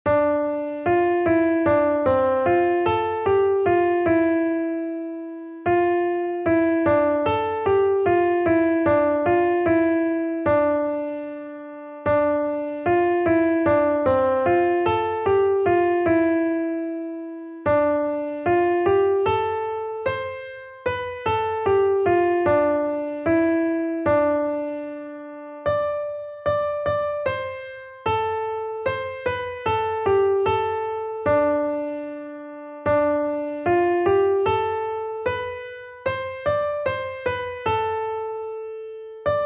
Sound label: melody